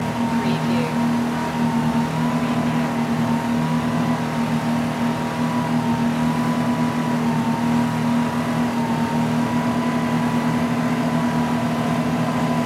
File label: motor boat ride; loop